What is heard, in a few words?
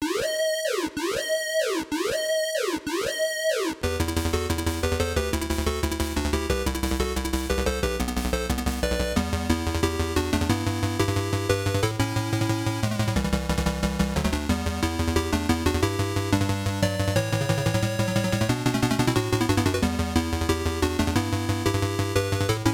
Red Alert (Alert); Metal Gear